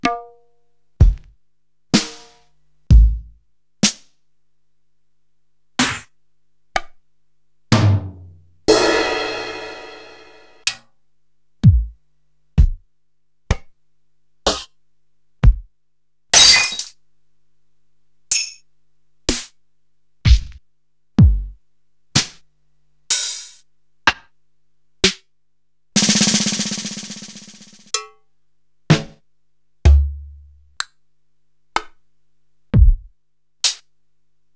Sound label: Korg TR-Rack, Samples Sliced; m8